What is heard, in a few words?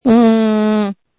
screams